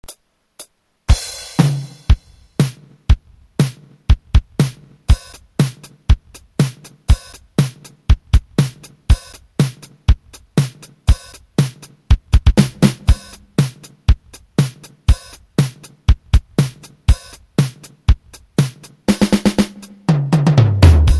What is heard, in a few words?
drum